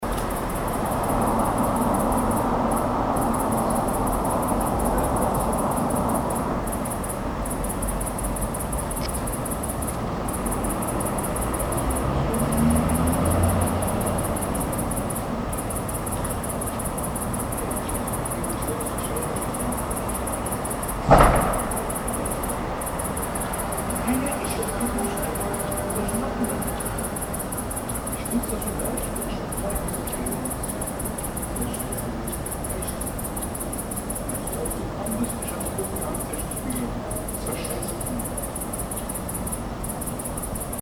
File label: crickets